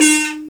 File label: Buzz Xylophn; Roland LCDP11 Africa VOL-1; Bala & Xylo